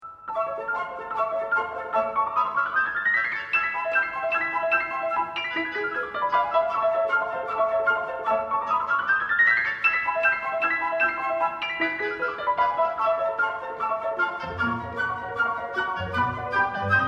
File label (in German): Klavierkonzert Nr. 2 A-Dur; piano concertos; Liszt